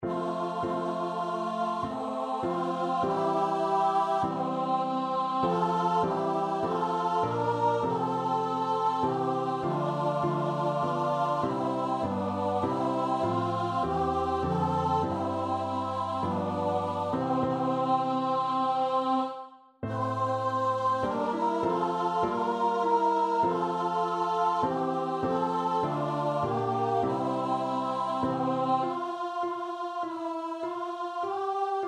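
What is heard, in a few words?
o come, soprano alto; vocal duet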